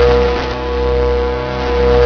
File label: suit charge; Source Engine; video game